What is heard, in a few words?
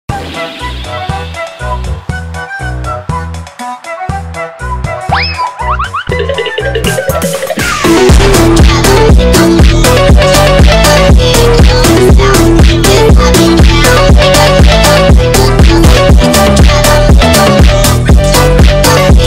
phonk; angry birds